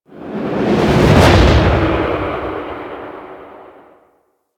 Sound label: Fortress Asteroid Whoosh